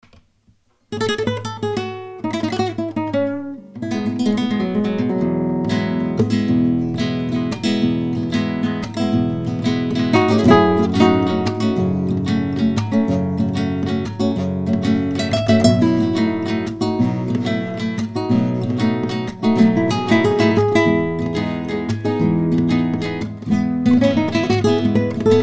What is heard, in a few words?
rumbita